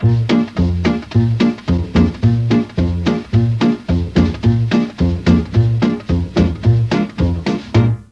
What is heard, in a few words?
D2 SAX, Mellotron; Mike Pinder - Mellotron Archive CD; FOXTROT+SAX